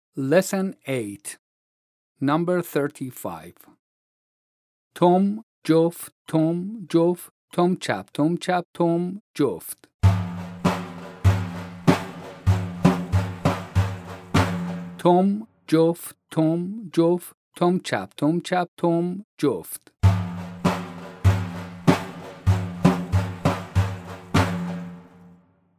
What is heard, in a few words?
vocalization and joft, Daf